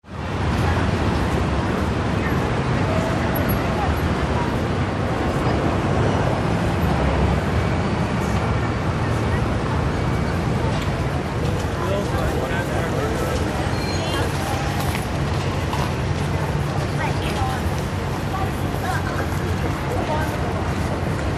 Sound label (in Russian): shum gorodskih dorog